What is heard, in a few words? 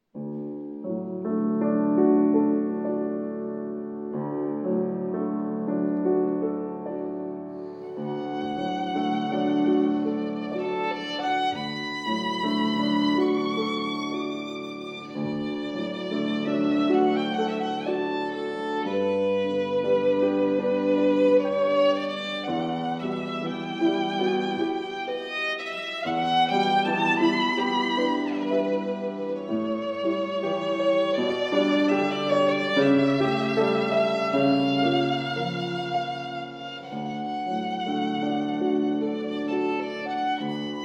Arr. For Violin and Piano; Thaïs Meditation